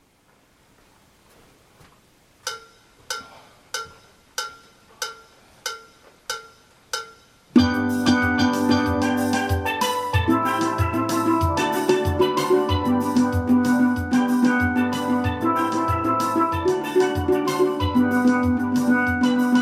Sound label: Alto, Steel Band